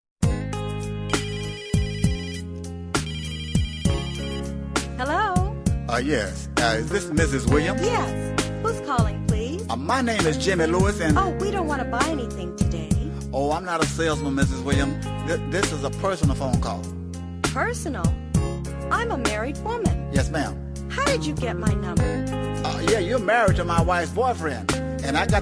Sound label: Ringtone